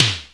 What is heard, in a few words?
EL SNAR; Electric Snare 3; Oberheim drum sound chips